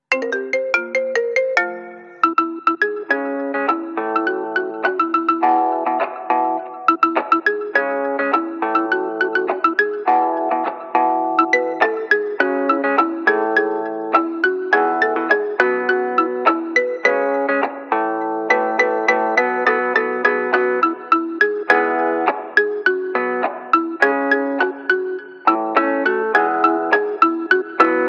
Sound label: marimba ringtone; itunes